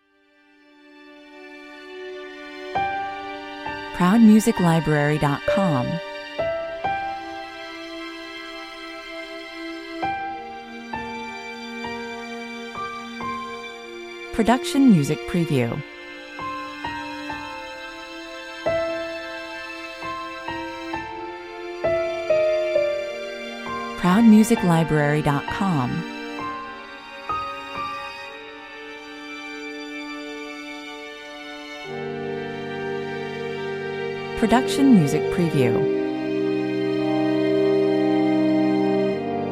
sad 65 bpm, Simple Piano melody with strings, sad and lonely.